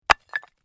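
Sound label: Brick Impact Or Cinder Drop; Stone slab, brick or cynder drop to the ground and settle; Rock Sound Effects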